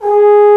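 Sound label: Trombone mf G#2; Digital Sound Factory Virtuoso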